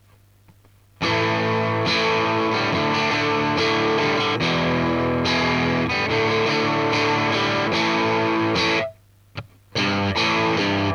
Sound 6V6

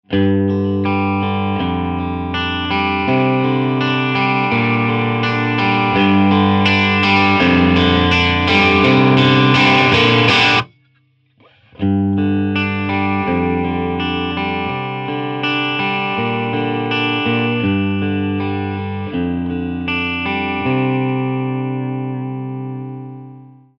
G5 6L6 crunch attaque

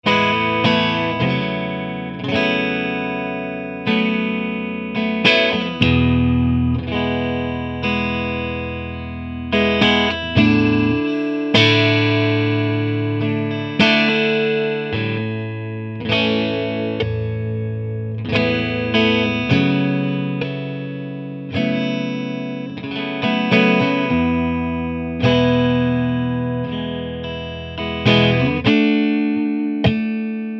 G5 6L6 clean boost

